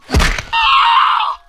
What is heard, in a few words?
smack scream; ooh ahh